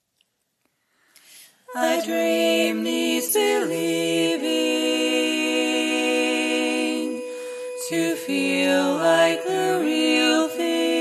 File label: Bari Dom